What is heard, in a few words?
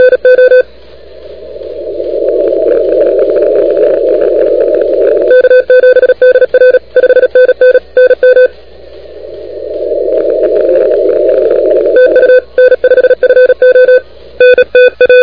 CW 28MHz; ARRL 10M